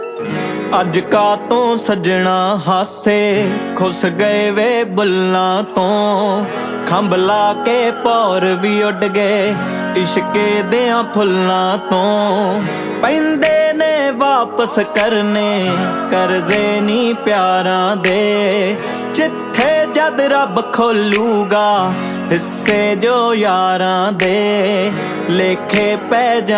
punjabi ringtone

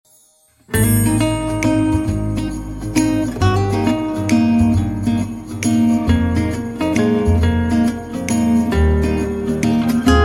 samsung breeze ringtone

